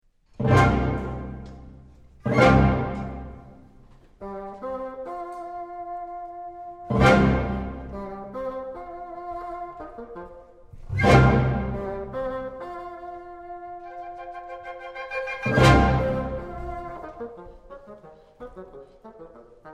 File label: Bassoon Concerto